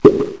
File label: coin in; pvpoker